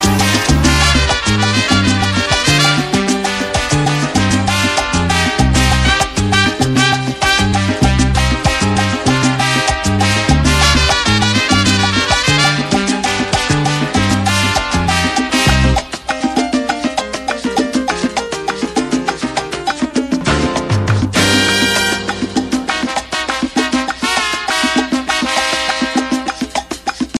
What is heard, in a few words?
colombia mix